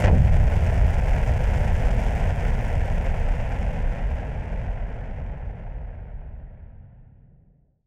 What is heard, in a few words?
Noise Bomb Boom Filter; musicradar; bomb factory